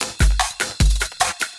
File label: LOOP, Drum & Bass, 150 BPM